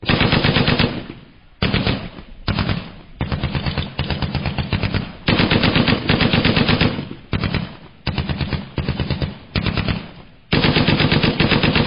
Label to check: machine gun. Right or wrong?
Right